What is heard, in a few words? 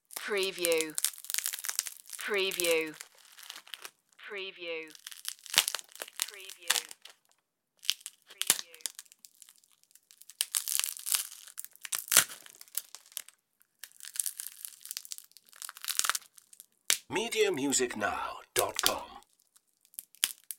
crunchy bread